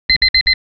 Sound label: BBBBeep; TELEFINDER